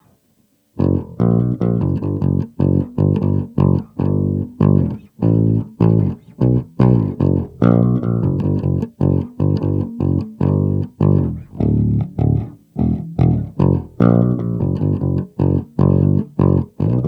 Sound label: jugg bass